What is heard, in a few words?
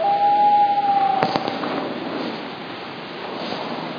loon cry